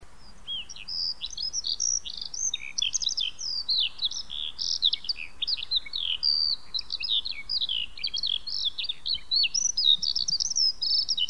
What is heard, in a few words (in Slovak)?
skovranok